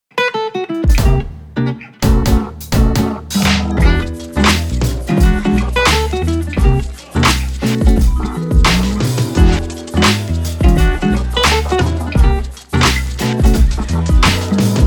Acoustic

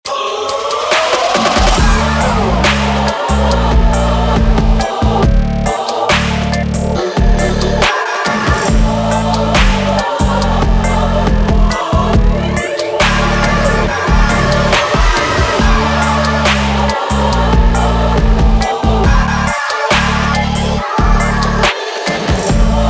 Dark Choir

